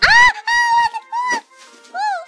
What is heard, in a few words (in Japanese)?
あぁ, 情けない声